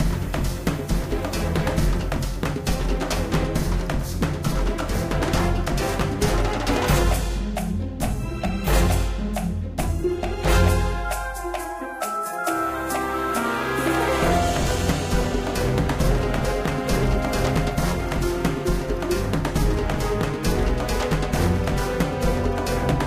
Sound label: Action; James Cameron's Avatar The Game